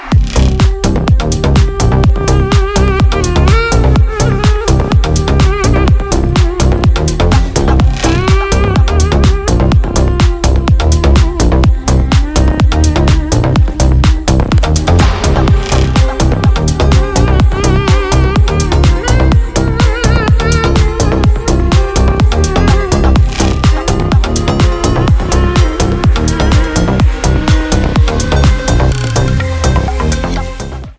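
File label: background music ringtone; ROMANCHAM TITLE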